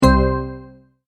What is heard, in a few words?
Multimedia Tone-Stop